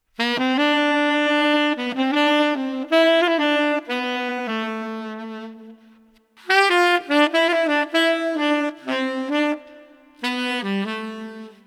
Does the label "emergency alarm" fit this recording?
No